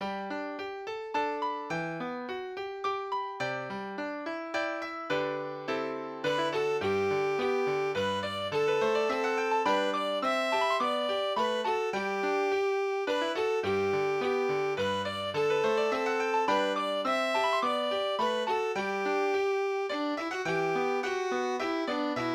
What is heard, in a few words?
Come Thou Fount of Every Blessing VIOLIN, Key G